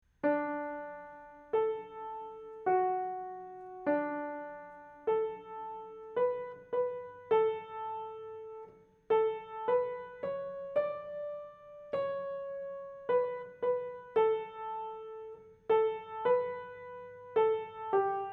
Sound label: Hymn Melody; How Lovely Shines the Morning Star